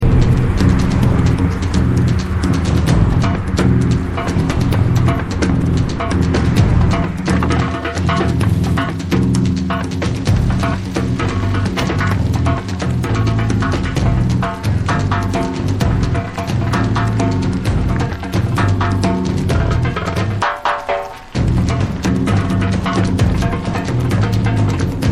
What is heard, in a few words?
Frog